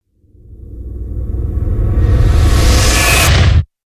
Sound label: Gigawatt Wipe Wipe; Ninja Tracks